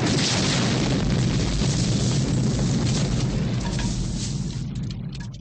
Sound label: explosion; WILLIAMS; INDIANA JONES; Indiana Jones Original; explosion of wood house